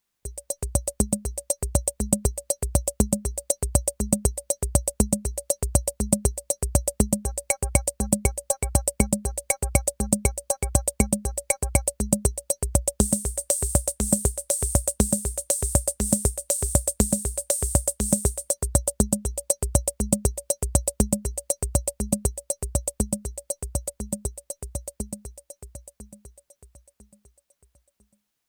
Roland CR-78 Chacha Rhumba Kombi

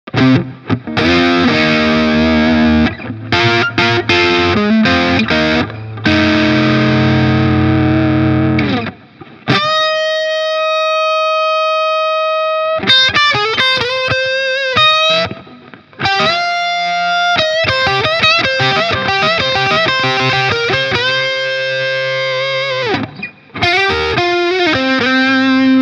FGN Neo Classic LS 30 Mitte verzerrt

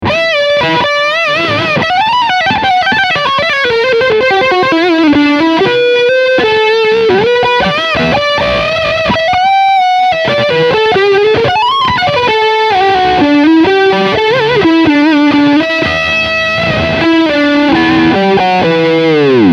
Leadsound Steg HB

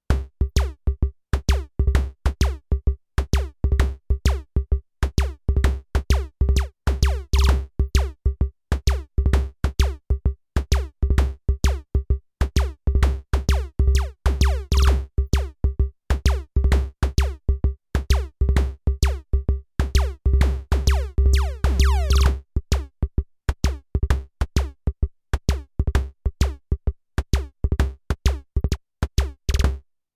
Comp Plus Synth 150 Hz SC 5 zu 1; Fredenstein Artistic

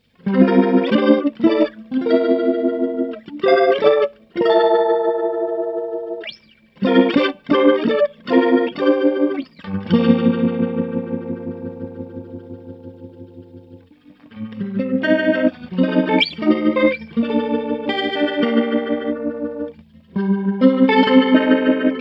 rotary korrekt

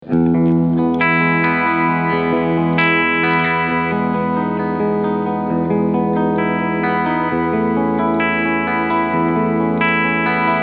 D'Angelico Deluxe Brighton Steg Humbucker Clean

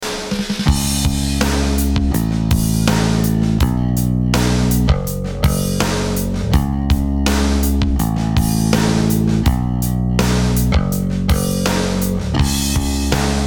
Mastering durch Micro BR

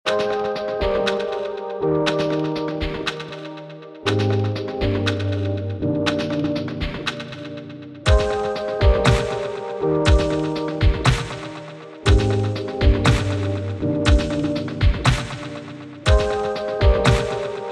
Electronic Grooves Unbearbeitet

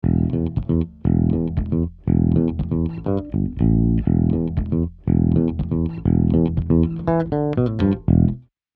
E-Bass 573EQ; IGS Audio

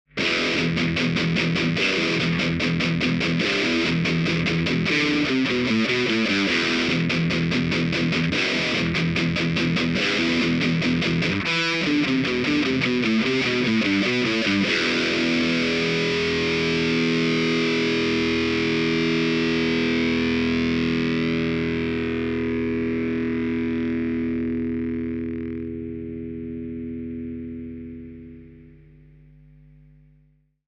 Shure SM57 E Gitarre 45°

